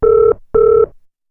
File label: Dial